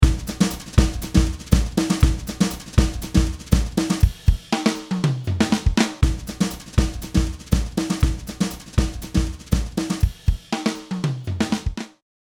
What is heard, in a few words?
Drum Beat